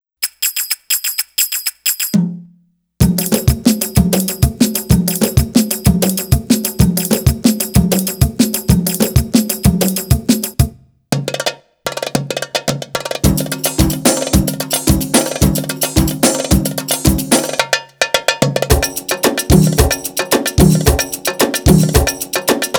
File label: Arabic Rhythms